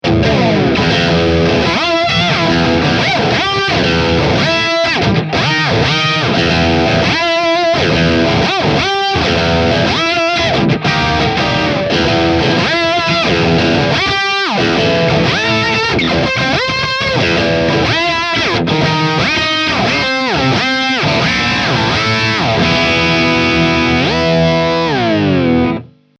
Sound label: hx Whammy